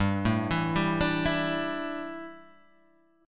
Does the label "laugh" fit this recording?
No